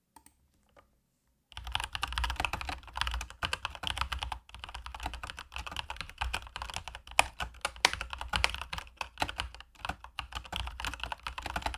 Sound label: soundtest logitech g pro x tkl lightspeed